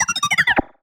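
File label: Sci-Fi Droid Robot Emote Beeps; jasem just another shooter with electronic music windows 2017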